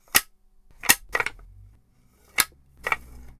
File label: indoor